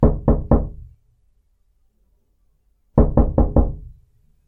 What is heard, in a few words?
knocked in a bathroom